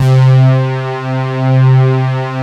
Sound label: FAT SAW PAD; Pro-Rec - Synthsonic